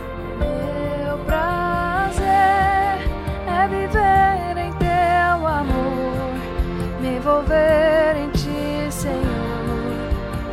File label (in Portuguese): CONTRALTO MELODIA